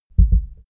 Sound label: heart beat double fast